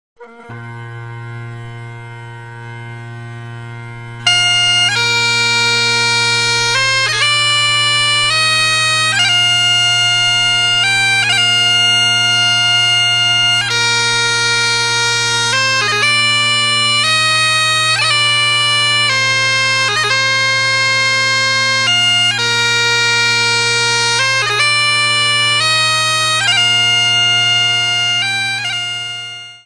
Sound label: Kilted Music; HIGHLANDER